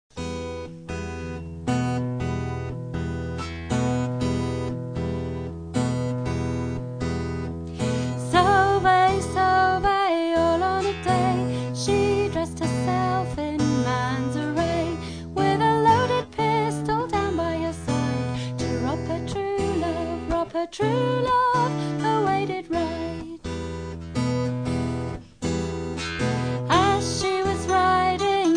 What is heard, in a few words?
Sovay Guitar and Mandolin